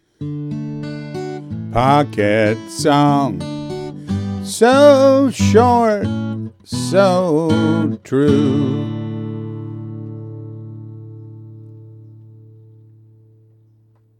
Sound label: pocket song